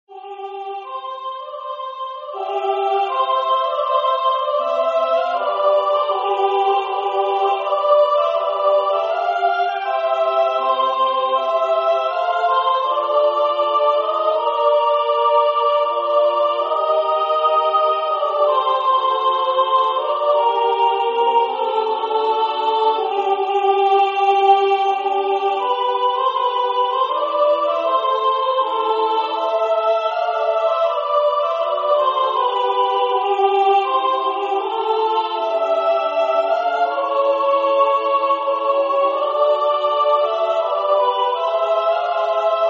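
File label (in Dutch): vrouwenkoor soprano I; H.Purcell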